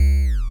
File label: 8bit se